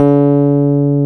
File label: KEY MKS20, Pop Pianos; Pop Pno+MKS